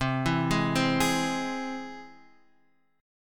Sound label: G sharp Augmented C, guitar